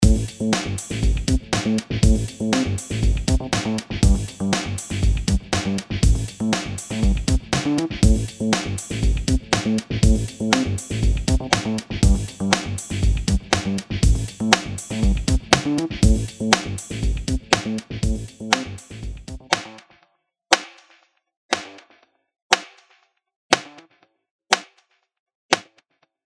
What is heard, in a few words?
Loop Snare punch And Separation; AtomicTransient